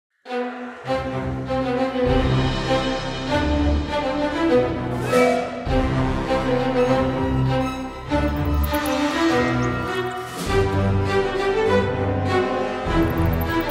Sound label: orchestral; umut sokagi film muzigi; kadir inanir